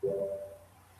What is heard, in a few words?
android 4 4 notification; selenium